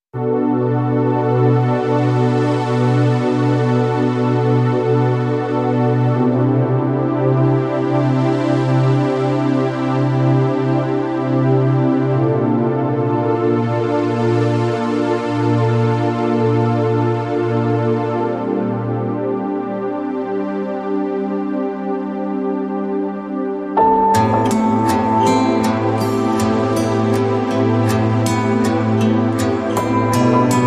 Underscore

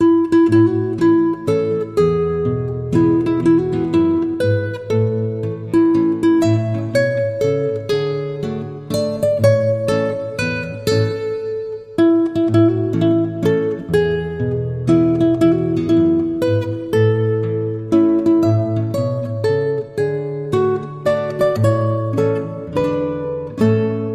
Nylon Guitar Happy Birthday

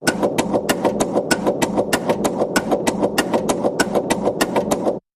Tick Fast

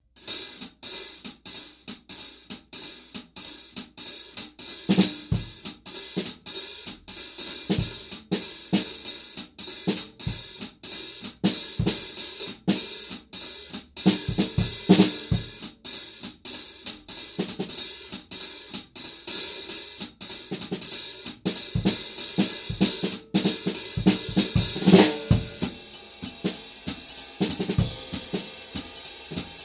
Drums Only

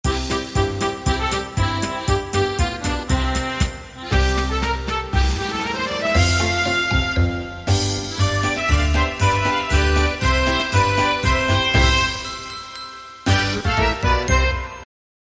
Christmas Polka

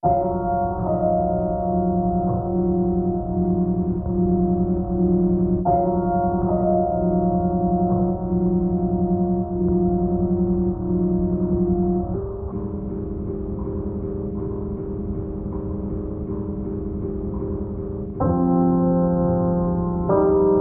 Pianoscape

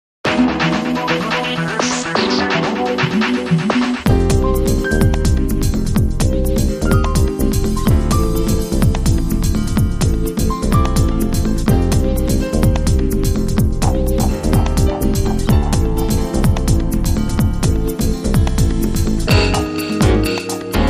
Future Bossa

